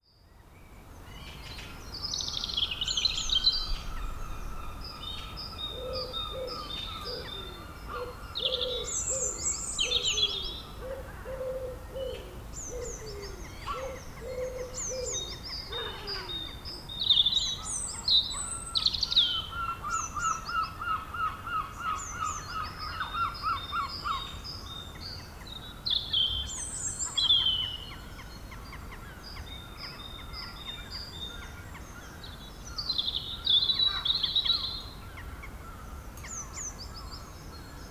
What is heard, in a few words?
Birdsong